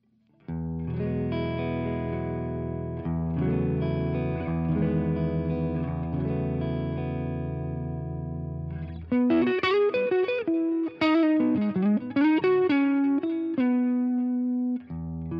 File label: Line 6 Catalyst Chime SM57